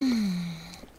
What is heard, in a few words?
asmr; ulker cikolata fndk ruyas